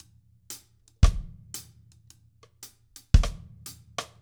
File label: REGGAE